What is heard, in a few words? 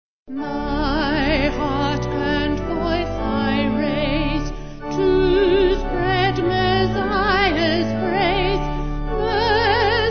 Vocal Organ